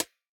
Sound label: hats G, hip-hop drum kit; 99Sounds x Monosounds; Moon